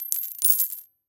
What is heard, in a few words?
Coins Drop; SFX Producer